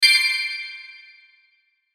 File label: Hit Chimes Twinkle, Hit Chimes Twinkle High; Production Element Imaging Element Accent Transition